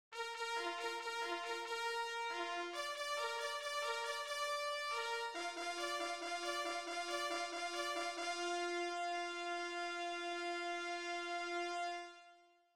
Halali

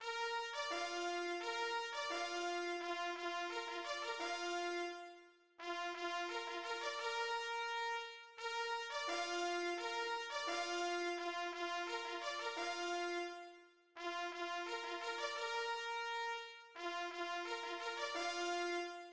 Hunderuf

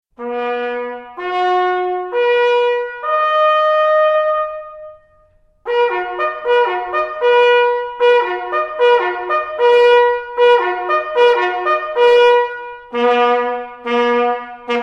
Anblasen des Treibens

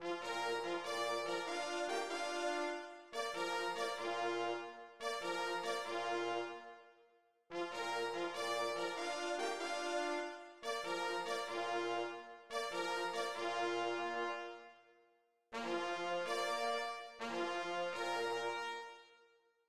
Dachs tot

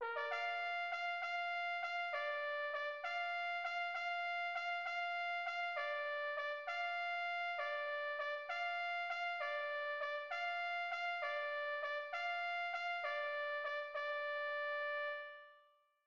Das hohe Wecken

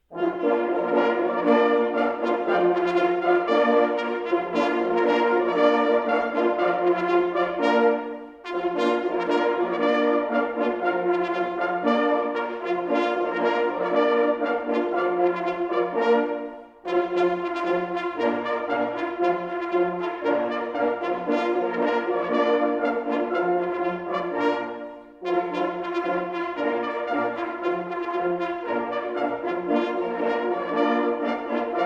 Hessischer Jagdmarsch

